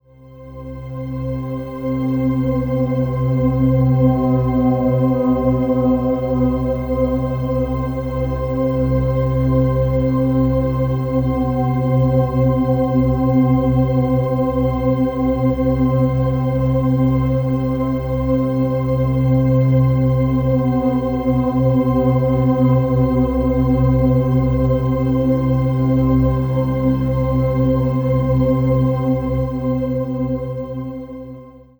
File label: Atmosphere; Consequence; Ghosthack